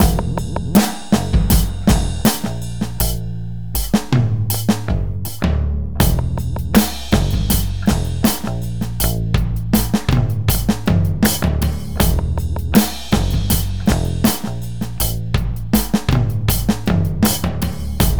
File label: drum basse